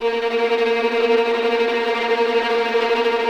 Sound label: VI TRM F2A; AKAI Advance Orchestra Upgrade 97 Vol.1; VI TREM F